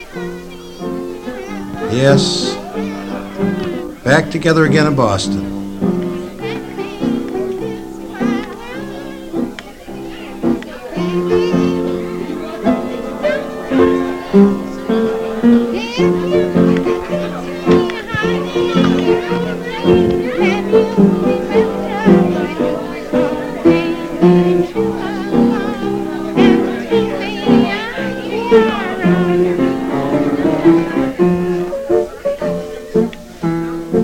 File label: Piano